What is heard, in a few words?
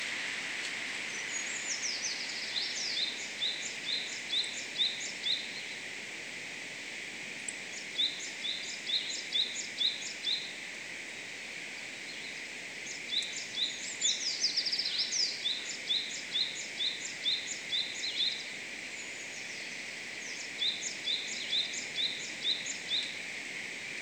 kibasiri, bird song; キバシリ; さえずり; キバシリ科; 新潟県東蒲原郡阿賀町川口 森林; J-eco 鳥のさえずり図鑑